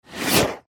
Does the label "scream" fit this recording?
No